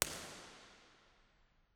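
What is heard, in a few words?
medium, impulse response, St Georges Episcopal church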